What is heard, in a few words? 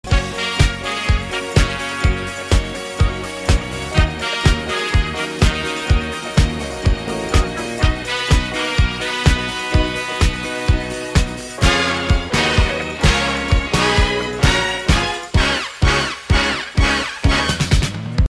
news headlines bg